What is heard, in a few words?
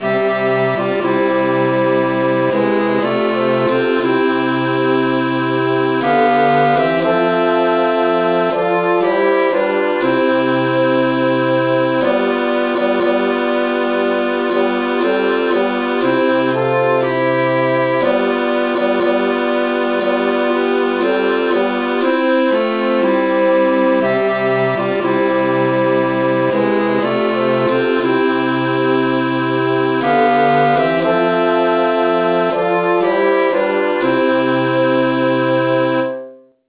traditional key F